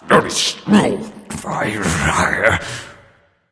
grumble; smite; osiris

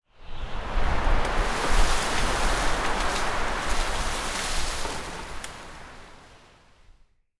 ambience foliage; smite; conquest

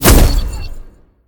aoe; smite; tiamat

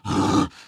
zombie run; smite; npc; roman archer

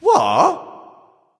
nv confused; cupid

